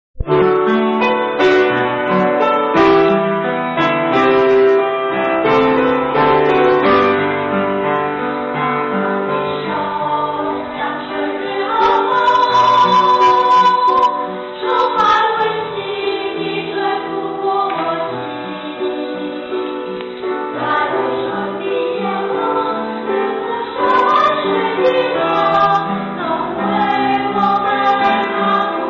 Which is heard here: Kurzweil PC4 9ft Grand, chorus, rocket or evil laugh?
chorus